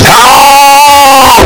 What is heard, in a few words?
feral scream